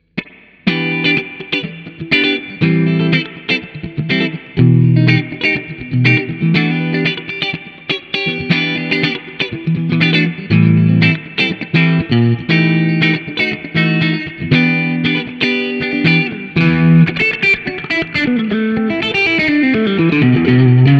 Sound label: tl68 neck bridge